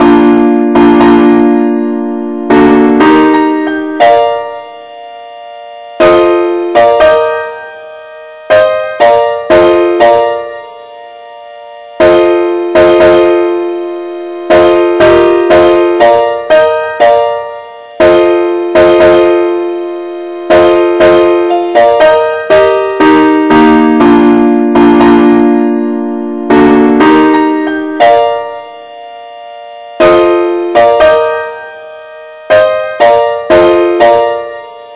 compare Chords

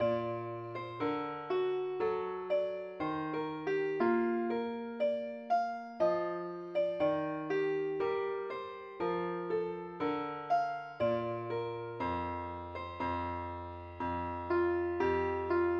mandolin

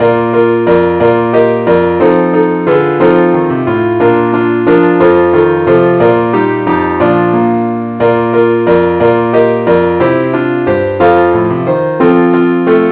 keyboard only key Bes

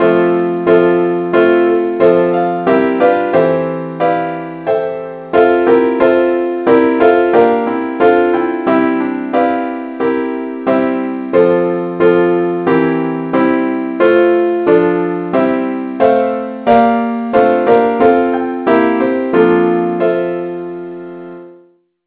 key f; saintthomas

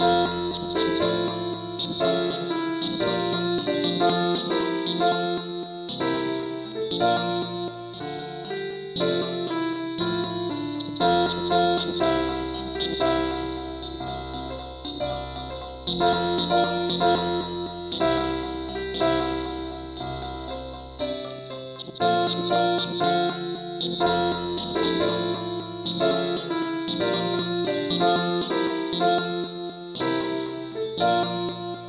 ukelele key f

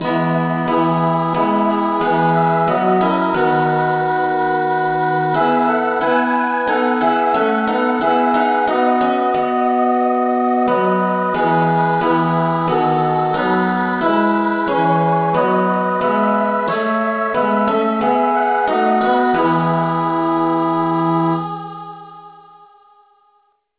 saintthomas rev choir key e

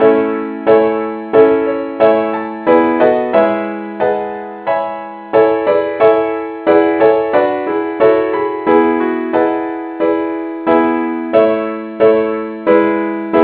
key a; saintthomas

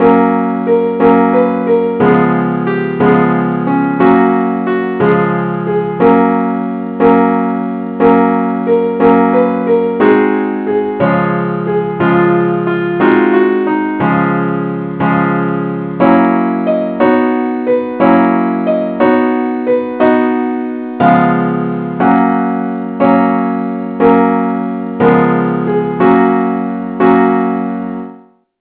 simple acc key ges; angelvoices

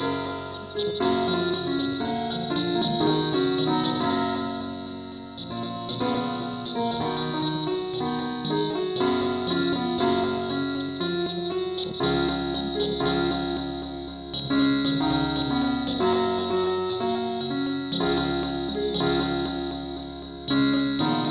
keyboard only key ges

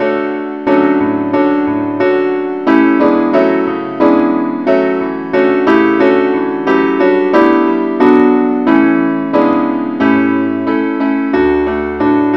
keyboard key d; saintthomas

